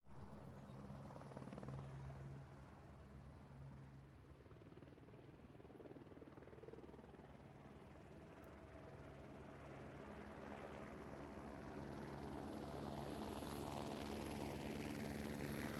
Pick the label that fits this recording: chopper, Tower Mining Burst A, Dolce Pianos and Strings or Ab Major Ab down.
chopper